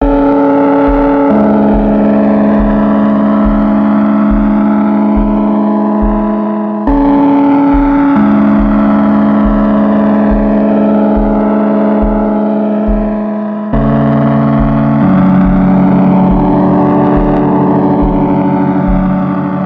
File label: Drumbeats Melodic Spooky Melancholy Beat